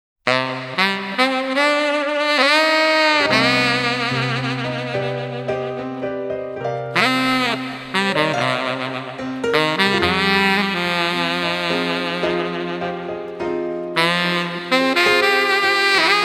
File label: (piano version)